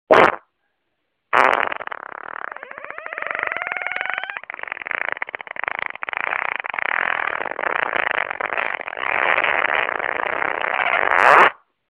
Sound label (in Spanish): Fuga, pedo